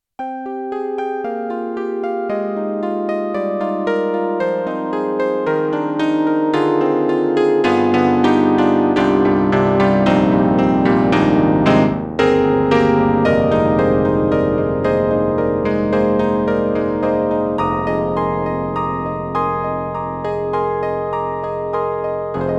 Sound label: SD-80 SA Piano; EDIROL